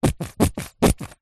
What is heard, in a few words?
foley sunscreen bottle squeeze empty nozzle; zapsplat